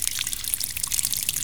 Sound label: stream trickle cave